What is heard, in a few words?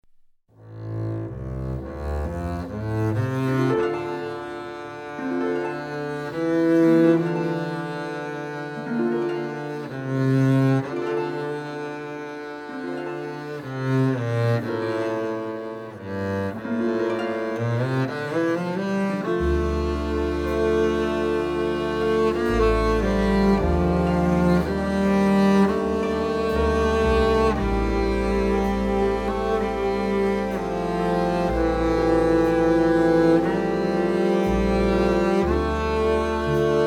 Bass Solo; Doodles; Double Bass; (Solo With Orchestra)